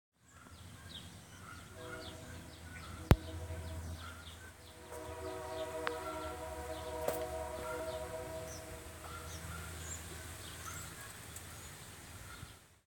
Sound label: Train Whistle